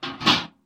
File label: close